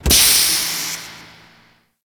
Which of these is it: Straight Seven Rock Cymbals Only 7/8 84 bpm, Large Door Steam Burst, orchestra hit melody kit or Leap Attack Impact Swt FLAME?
Large Door Steam Burst